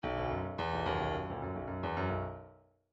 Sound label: bdf midi file grooves funk s funk s